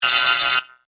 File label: Hit Scanner Ray; Hit Sound Effects; Sound Design